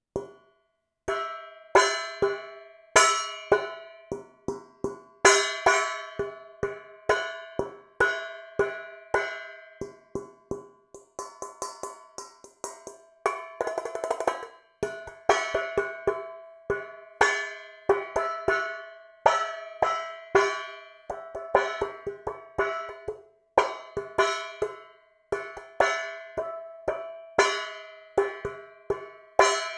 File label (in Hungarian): fémtál ujjal való kopogtatás hátulról; Perkupa; hangkeltés